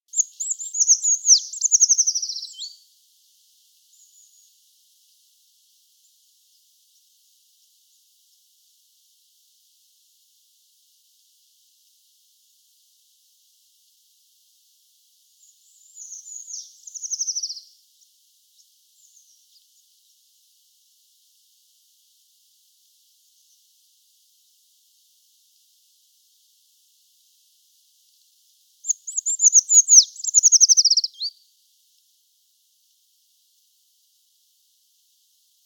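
obyknovennaya pischuha golos, common treecreeper voice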